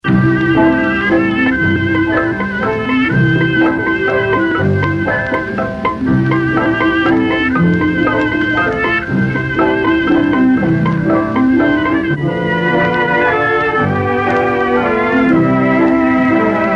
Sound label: Old Time Jazz Intro